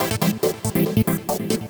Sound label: TECHNO SYNTH 140; Keys